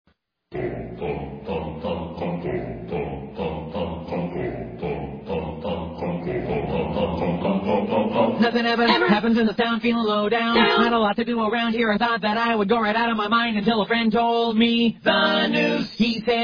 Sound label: Vocals Only